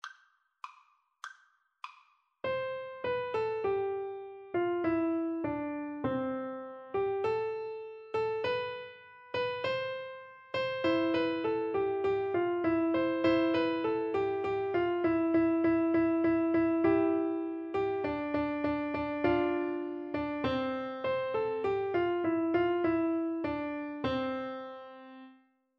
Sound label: piano duet